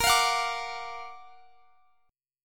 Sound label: F sharp Augmented Major 7th A sharp, chord